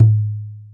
arabic perc, Arabic Percussion Kit

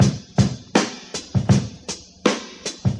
080 bpm walk in the park, drum loops and breaks

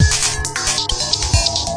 synth and electronic loops

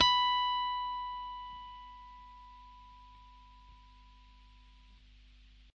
E 7 fret, acoustic guitar harmonics

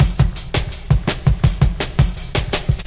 166 mellow kick break; 161 to 180 bpm

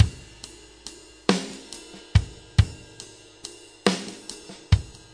six eight Loop, 100 to 105 bpm; Six-Eight Loops

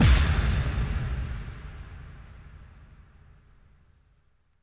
drop kick; Punches Hits Discoblasts

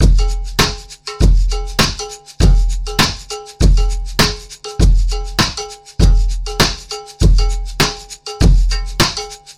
100 poly cow

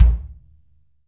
kick, Multisampled Real Kit; REAL LIVE KITS

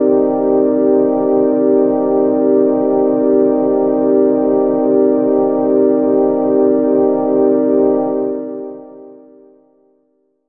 pad transcendent; Pads

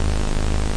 sizzle, VIDEO GAMES; pulsar

